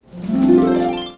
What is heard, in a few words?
new mail; spin city